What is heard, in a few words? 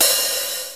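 open hi-hat, DrumBase5000; Crypton - DrumBase5000; CFZ KIT 2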